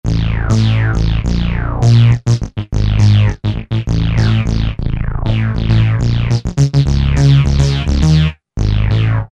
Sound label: NuFloor, Yamaha DJX Voices